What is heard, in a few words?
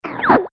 Radio Transmission End; Learn to Fly 2